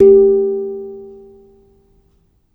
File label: G3 light; foxdot default